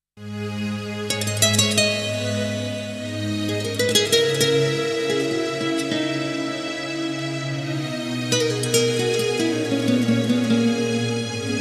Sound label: Percussion Mix